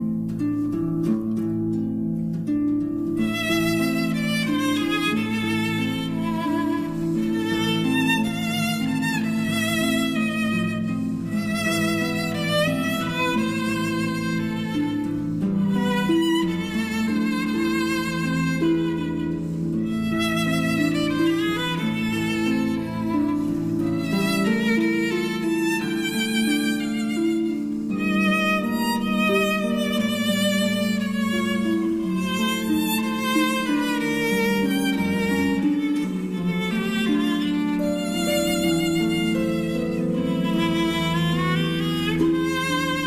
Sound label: Berceuse, for violin and harp